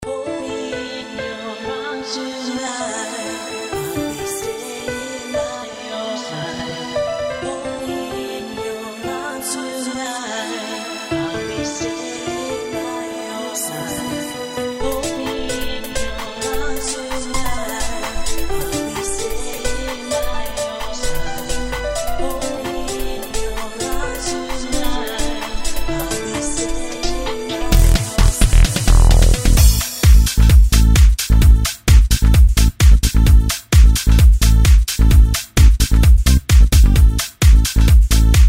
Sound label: My sad heart